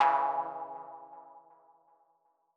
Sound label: Mono DBeat C#3; KG MegaSaur